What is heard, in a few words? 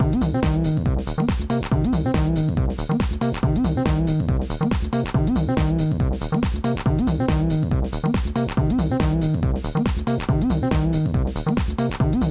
tb303 (fast); Project Yume 2kki; Yume Nikki